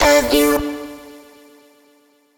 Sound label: Vocal one shot D; Slate Digital Omnivox Vocal Sample Pack